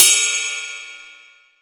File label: Hats Real Open